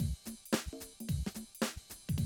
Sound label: 110, Classic Drum Loops